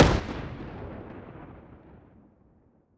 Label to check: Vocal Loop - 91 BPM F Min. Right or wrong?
Wrong